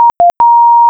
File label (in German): Anruf Von Etage